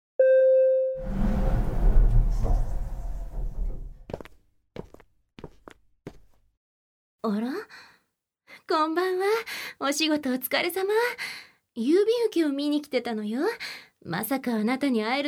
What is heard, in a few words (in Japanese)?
耳かき; 熟女耳かき